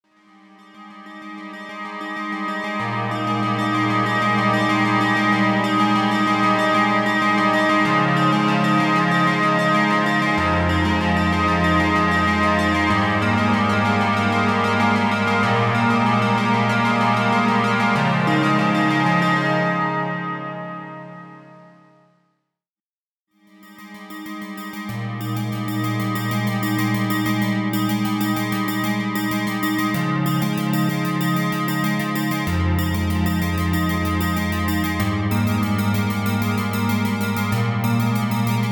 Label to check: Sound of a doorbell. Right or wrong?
Wrong